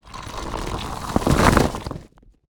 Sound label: wall, complete; 0AD